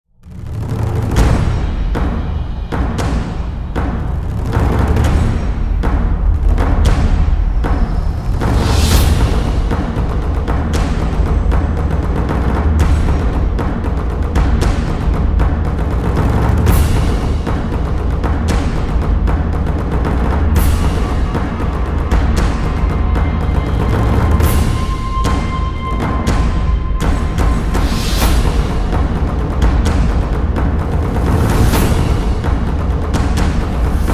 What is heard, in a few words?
Drums Only Version